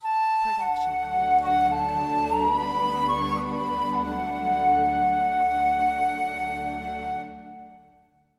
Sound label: Celtic Ident; SoundsCrate